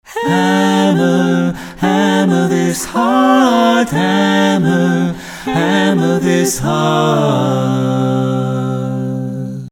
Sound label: Hammer